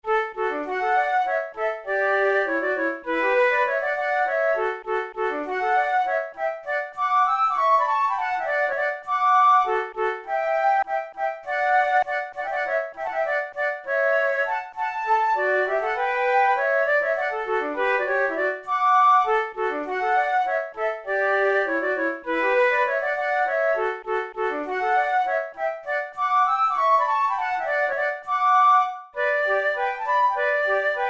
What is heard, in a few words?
duet, flute